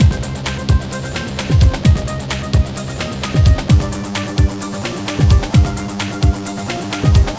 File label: la indu; magix